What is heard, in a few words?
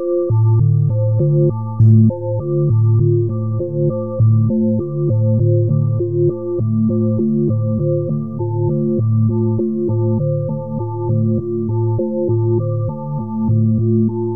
Bluetile moon slow